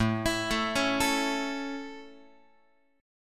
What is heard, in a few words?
A Major A, Guitar; arpeggiated